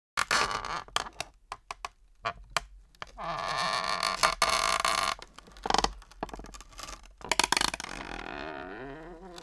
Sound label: Wooden chair small creaks of movement